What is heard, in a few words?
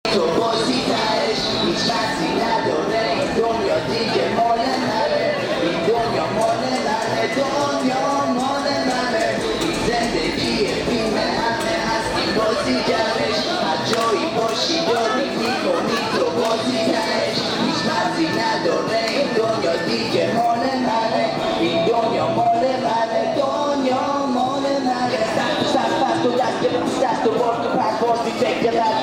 taksim foreign music